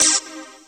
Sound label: dance chord